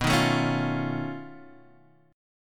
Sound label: B 9th Flat 5th B down Guitar DADGAD